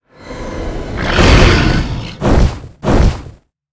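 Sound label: king peregrine spawn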